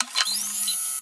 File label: zoom in